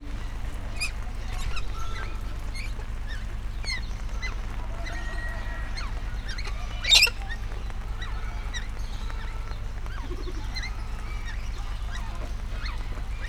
rozsas kakadu; állathangok; nyíregyházi zoo 2010

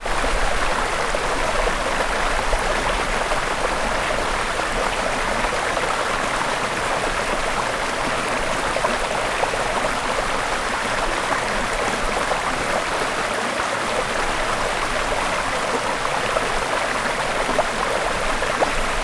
szep hangu szello, patak; visegrad

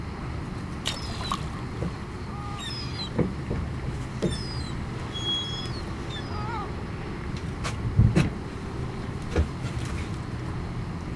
egy-egy vijjogás a hajóról, sárgalábú sirály, Thassos; allathangok; termeszetben; marantz

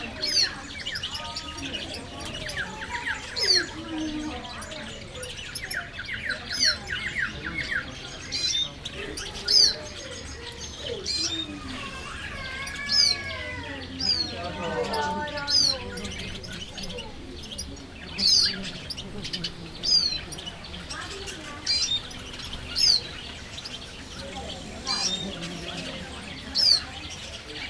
csíz; jászberényi zoo 2015

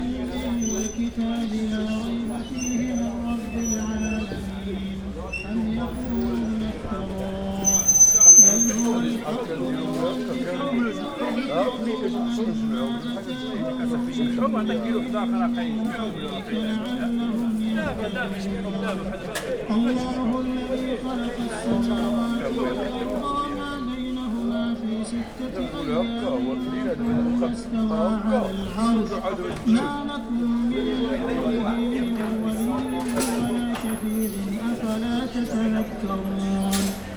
arab zene medina

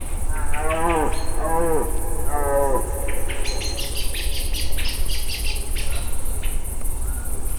madar, gimszarvas; termeszetben

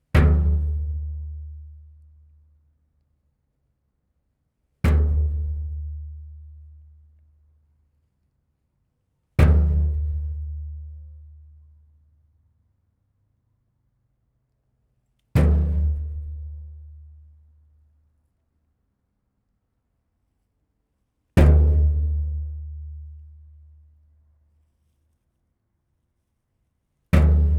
tizenhatszori megrugasa, olajos hordo

